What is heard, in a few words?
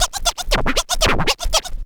scratch fills; SCRACTH FILLS; Megabass Remix! 2